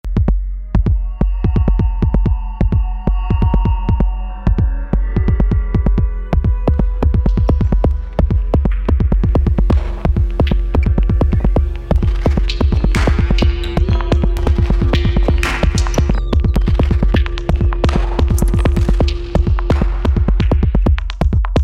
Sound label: Noise